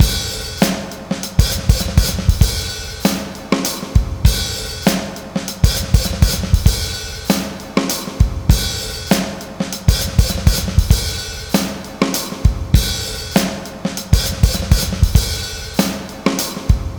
99 bpm, Breaks; CHEROKEE 7; 90 s Sample CDs; Planet of the Breaks

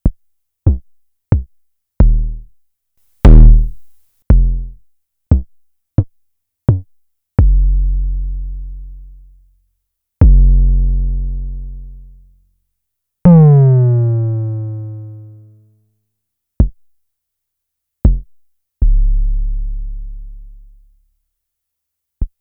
Schlagzwerg Kicks; Fricke

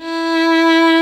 VIOLINS E3, LEGATO VLNS; Instruments & Percussions; InVision Interactive; Lightware VOL-1

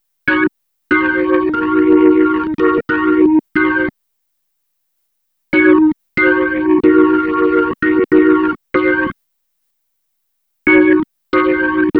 Drifter Dirt Organ, Electro Lounge; Sonic Foundry (Sony Creative Software)

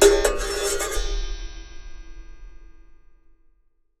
Raw, Wire & Tin, contact mic

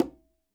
Quinto, Percussion

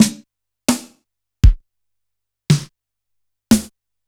Yamaha PSR-E323 Standard Kit

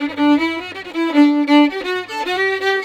160 G violin, Celtic Flavours; USB Soundscan vol.42; AKAI

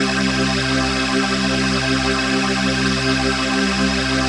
PHASE PAD

